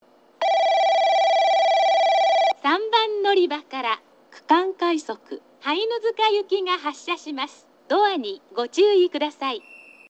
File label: yoshizuka regional rapid hainuzuka; 3番のりば発車放送（区間快速 羽犬塚行き）; 吉塚